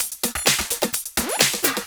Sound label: Houseloops 128BPM; Vengeance Samples